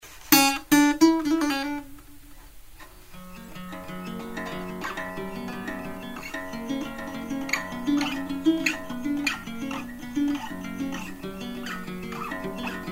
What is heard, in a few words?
blue guitar number spanish house; placerville